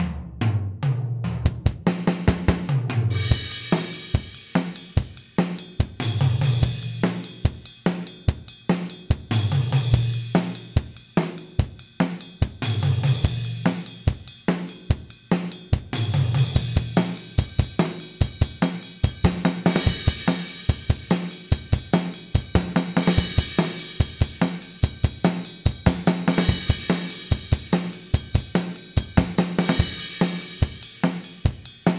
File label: hard rocker